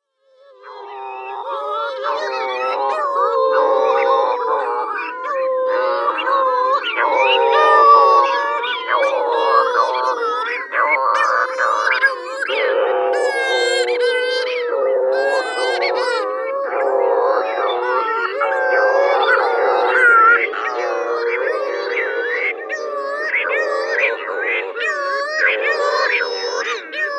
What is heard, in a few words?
Cape Verde Shearwater